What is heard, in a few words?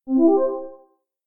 message sent instant; stereo; ocean